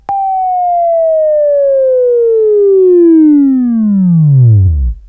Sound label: WAV Right, Sweeping